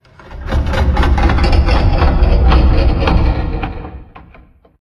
Metal Stress; silent hill homecoming; ps3

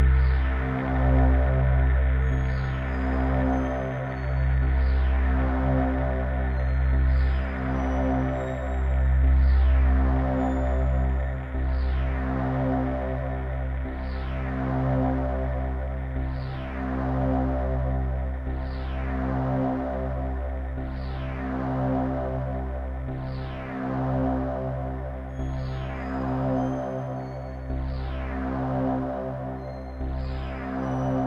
Item Room - Elevator; Metroid Samus Returns

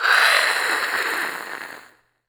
ghost witch voice hiss; Infernal Radiation

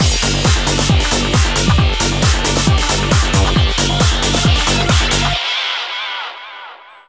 BGM GAME OVER; pro evolution soccer 2; world soccer winning eleven 6; playstation 2

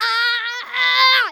VOICE Girl 4yo Hurt Long

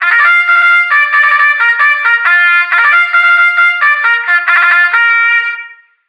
Horse Race Bugle; call of duty infinite warfare; ps4; windows; xbox one

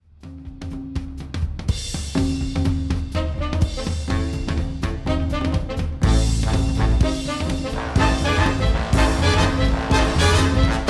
Cannon Beatdown Unused Ending; Sly Cooper Thieves in Time PS Vita PS3 2013

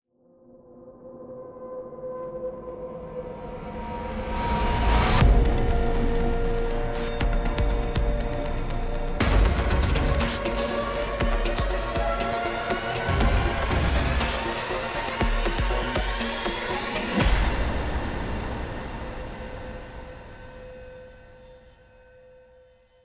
Character Select; Doppelganger; SoloMode

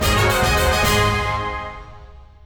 Evolution (Short Fanfare); pokemon go ost

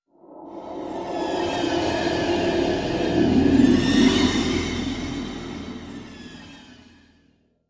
Stinger Swell Long

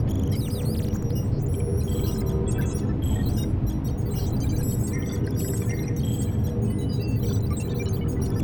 ambience - batcave

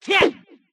slash; goemon

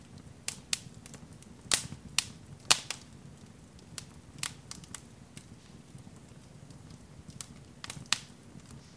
Fire Close

